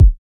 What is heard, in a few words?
Kick; Samplepack Produtique